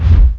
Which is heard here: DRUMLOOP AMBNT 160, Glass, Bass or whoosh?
whoosh